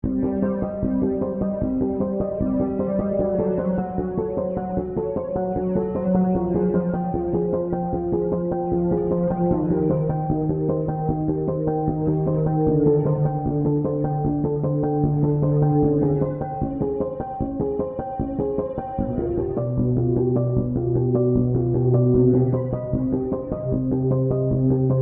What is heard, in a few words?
Distant harmonics memories